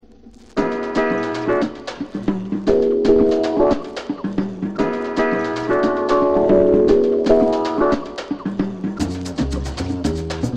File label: Samba